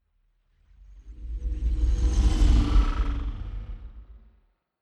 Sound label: sci-fi vehicle train drive by hum low; raumschiff